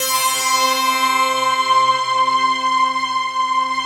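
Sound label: rough stack, Rough Harpsichord; Optical Media International; Sonic Images Library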